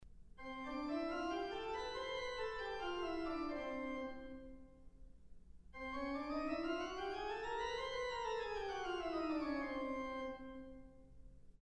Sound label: major scale vs chromatic scale